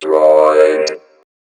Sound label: Droid; Motorola; ringtones; Droid 2 R2 D2